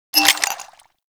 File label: Post Shot; Warframe; Scaldra AK